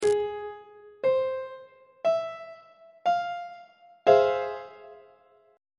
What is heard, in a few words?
e sharp minor major 7th 1st inversion on treble clef